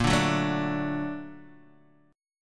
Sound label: Bb Augmented Major 7th Bb down Guitar Open D